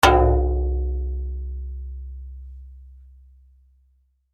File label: Impact Metal Bang Long Low Ring; Materials